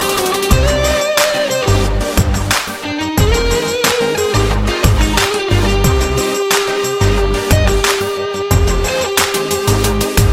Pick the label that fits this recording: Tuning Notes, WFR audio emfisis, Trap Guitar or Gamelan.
Trap Guitar